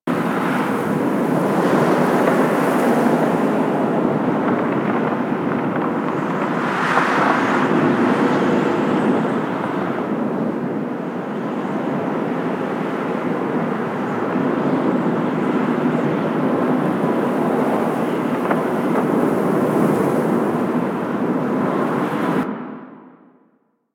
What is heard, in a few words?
Ice Exterior Amb; Warframe; Corpus; Ice Planet